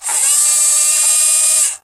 sentry move medium, weapons; tf

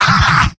laugh short; demoman; source engine; tf; mvm

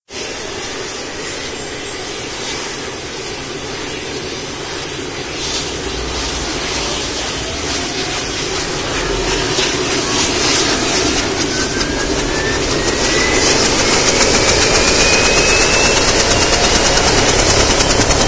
chopper startup looped; Left 4 Dead 2; Source Engine

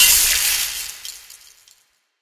spark, coop electric; Portal 2; Source Engine; video games; coop bot

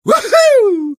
taunt flip fun; engy; taunts

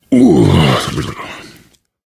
pain relief sigh, survivor voice; source engine; left 4 dead 2

